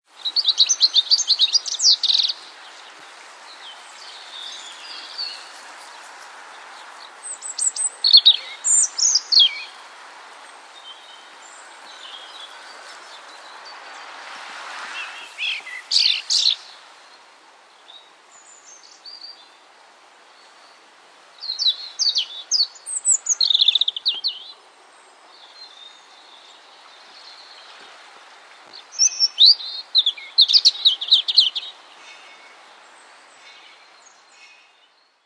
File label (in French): Rouge gorge familier